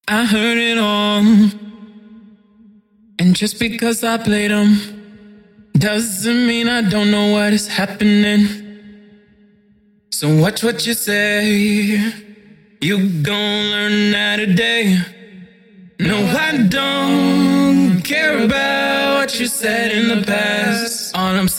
trap 137 Bbm; acapella

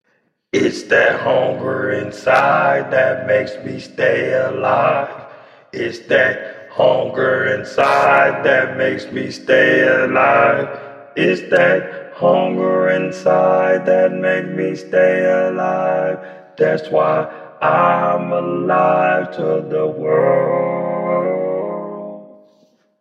hip hop 88 C sharp, Acapellas; Hunger Inside

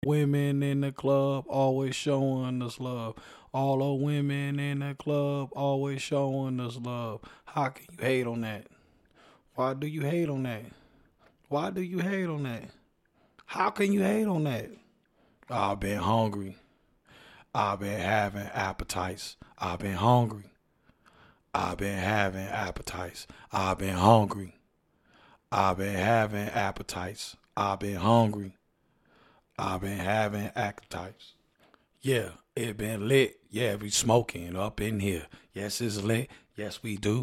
hip hop 117 Gm; acapella

